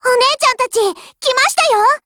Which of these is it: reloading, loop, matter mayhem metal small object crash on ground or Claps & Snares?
reloading